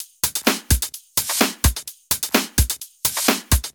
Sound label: Houseloops 128BPM; Vengeance Samples